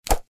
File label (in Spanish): cuerda; Twin Hook; GMTX